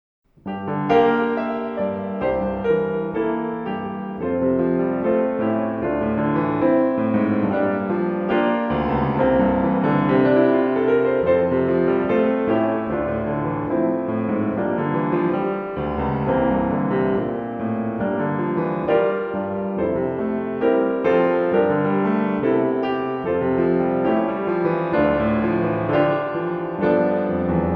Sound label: accompaniment; bambi